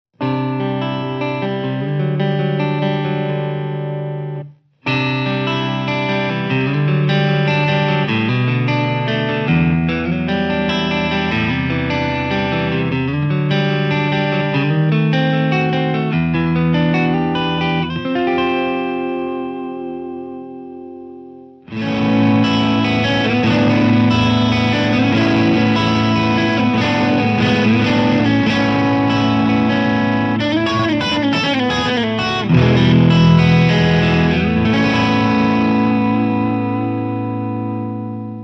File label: Clean